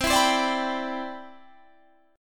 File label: C Major 9th, C, down, chord; strummed